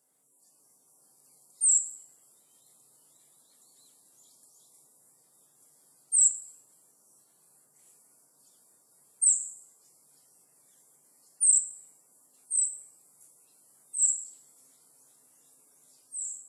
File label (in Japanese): shirohara, utsunomiya